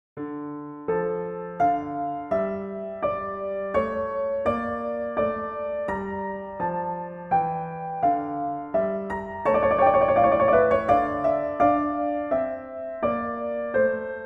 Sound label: Trio Sonata; Telemann; TWV42-d4; Essercizii Musici